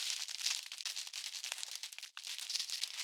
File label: Centipede Crall Loop